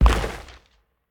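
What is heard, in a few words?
large step; Smite; Cabrakan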